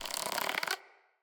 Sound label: Ext Insect; Midrath Island